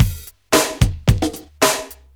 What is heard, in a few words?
BEAT, Beats 106-111bpm, Hip Hop; E-MU Formula 4000 Series Vol. 1; Hip Hop Nation